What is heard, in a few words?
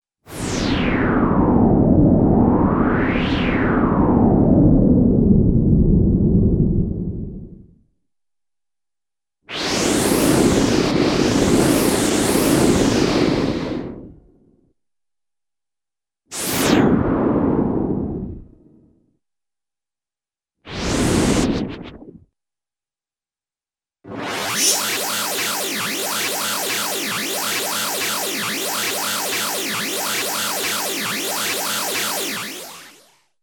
Space Ship Swooshs & Sounds, Cartoon; Hollywood Edge; Cartoon Trax